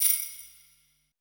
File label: E SHELL, ETH PERC